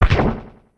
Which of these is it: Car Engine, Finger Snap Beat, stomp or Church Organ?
stomp